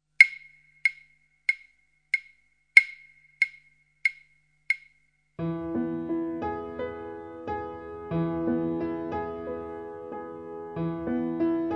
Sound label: piano